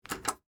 Door Unlock Hard, Foley, Door, Unlock, Hard, Wood, Back, Door; BlastWave